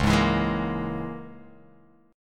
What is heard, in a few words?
Db Minor 6th Db down Guitar Open F; Dbm6 strummed; JGuitar